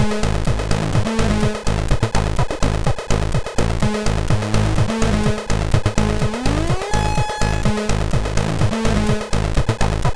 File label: intro, sid MOS6581R2; PCX Show 1994 intro